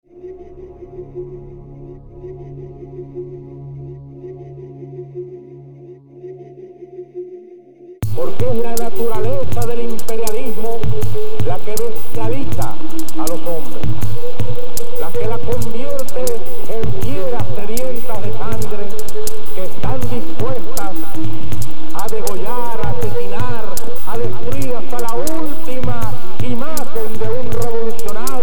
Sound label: Bolivian Wind